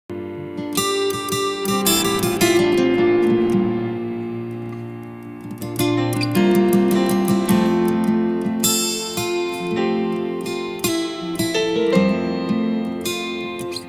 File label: Power Acoustic